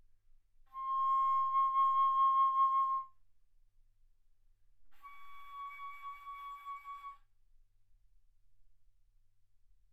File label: Bass Flute C6 Db6; ff